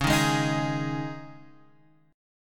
C sharp Suspended 2nd C sharp Guitar Half Step Down, chord